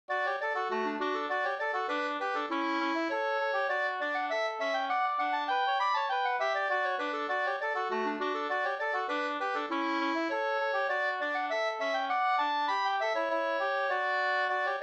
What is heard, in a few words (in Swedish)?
slangpolska 2st